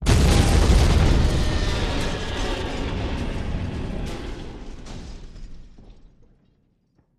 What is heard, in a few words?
Explosion Metal Deb, metal & glass